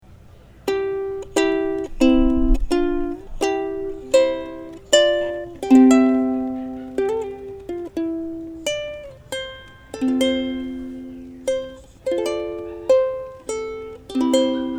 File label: Ukulele